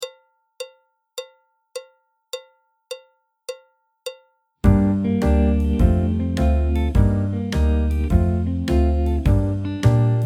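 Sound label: backing track, Jazz; alto, trombone, piano; Jazz Syllabus Level 3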